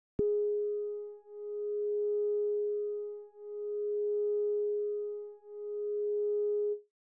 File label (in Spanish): Telefono Colgado